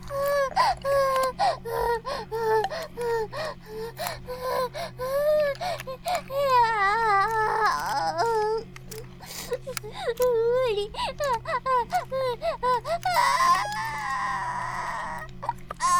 バイブ放置, ASMR

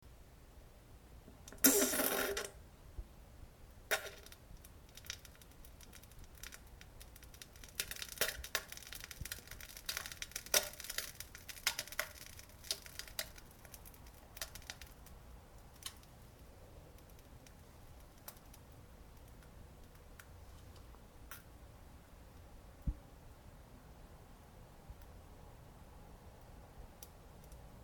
なんべん おなら ぶぷーう ぷむちゃちゃ 音

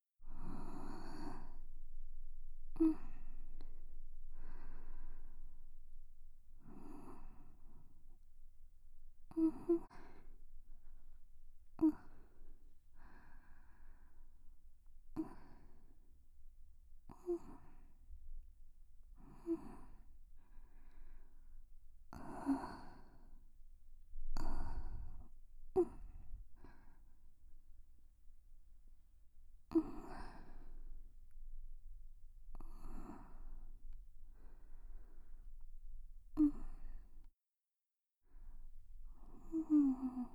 寝息ボイス(右側), ASMR

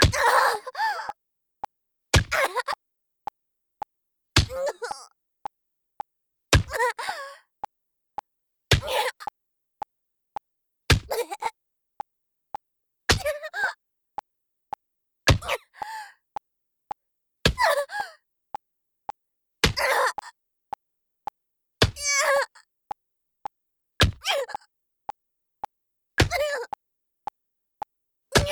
BPM110 メトロノーム

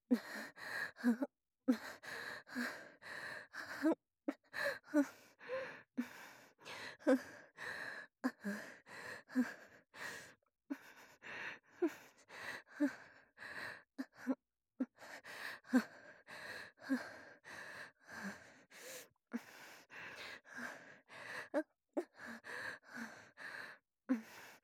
荒い呼吸

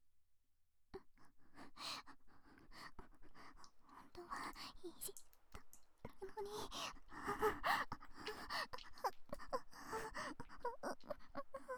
自慰

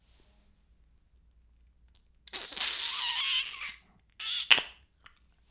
フェラ音声, ASMR; 白くて黒い魔性円環

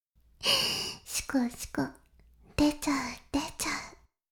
しこしこ音声, ASMR; 白くて黒い魔性円環

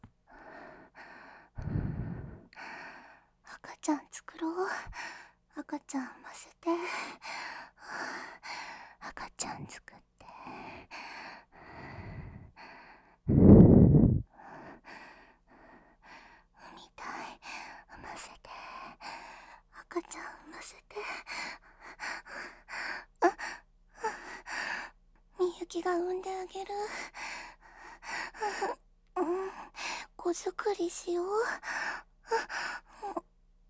喘ぎ; 赤ちゃん作ろ♪; DL製作班; ハーレムオナサポ 4人のメイドがご主人様のオナニーをお手伝いするバイノーラル

